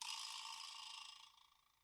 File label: vibraslap; Idiophones; Struck Idiophones